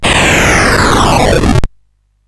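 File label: Moon Patrol, MOS 8580 SID chip music, games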